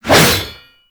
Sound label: Void Heavy Scythe Whoosh; Warframe; Duviri; Incarnon Adapters; Melee